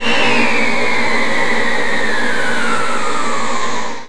GHOST, creepy sounds

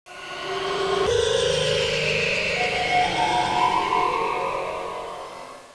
UHF WOOSH

